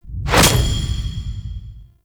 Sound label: Greed Token Pickup; Warframe; Pickups